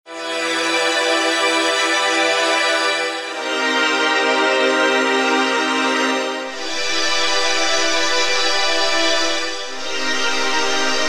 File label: Omni crush